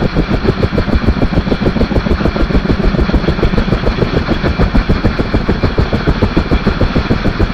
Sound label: Aircrafts Engines Rotor Ka27; DCS